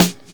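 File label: snare drum 70s; E-Lab; X Static Goldmine 2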